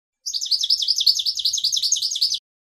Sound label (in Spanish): floreo chivi chivi; campero